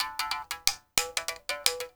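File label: SM MOUTH BOW; Spectrasonics; Heart of Africa 2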